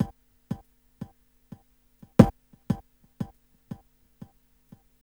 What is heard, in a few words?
Hip Hop R n B; FX LP; USB Soundscan vol.19; AKAI